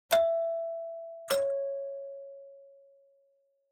Doorbell Mech Tone, Close

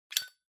Lighter (Open)

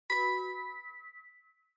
OS Sound (Speaker-Notification)

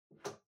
Pendulum Clock Hardware Far Key Remove

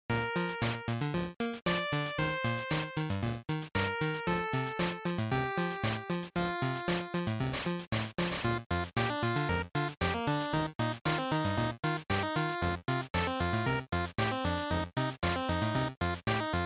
Action, Stage

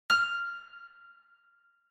Piano Low

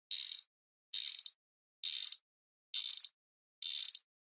Pocket Watch Wind Up; 懐中時計; 巻き上げる

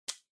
Portable MD Earphones Unplug

